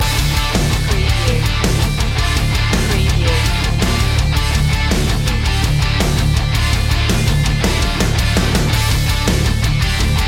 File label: battle ready loop